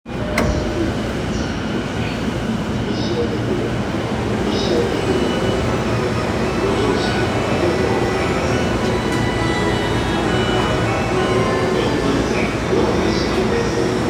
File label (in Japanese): JR中央線快速西荻窪東, train sounds